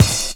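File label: cymbal, Drum & Bass, 160 BPM; Ueberschall; Drum & Bass Flash Trackz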